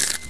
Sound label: Crunch